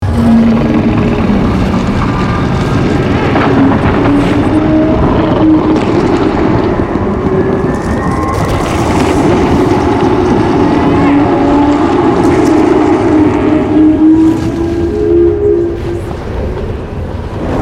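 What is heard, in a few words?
Ambience Halo Jump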